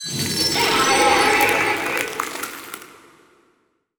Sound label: crystal, lithoids; lithoid portrait; Stellaris